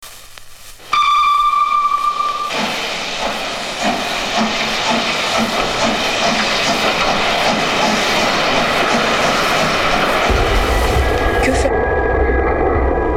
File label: yellow train